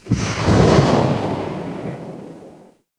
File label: magic scifi rocket